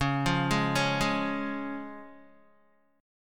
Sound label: Db Major Db Guitar; arpeggiated; JGuitar